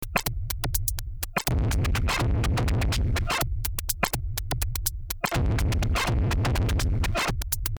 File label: half distorted